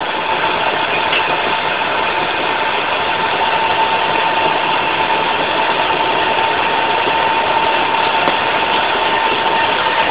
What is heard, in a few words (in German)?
pumpe; golf